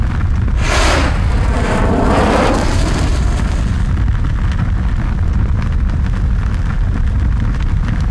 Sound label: siz flame, lava; zaero; world